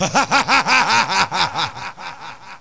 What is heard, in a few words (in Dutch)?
Lachen; Cafe Jingles